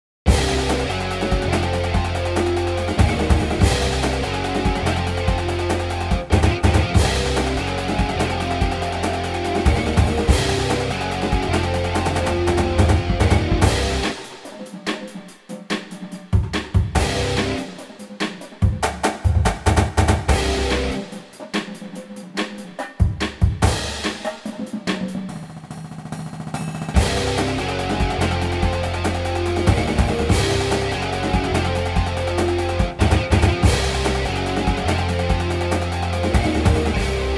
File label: rumble drums